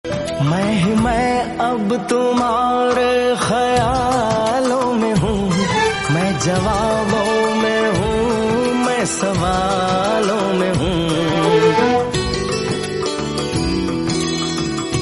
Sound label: Hindi Song; Veer Zaara